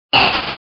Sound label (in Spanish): Grito de Torkoal